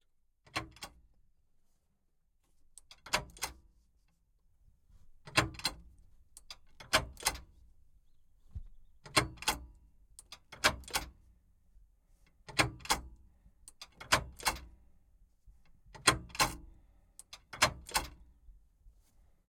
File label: Ford Fiesta WRC2 - Gear Stick Movement; Pole Position; Ford Fiesta WRC2 2022; VAR SFX; MS Decoded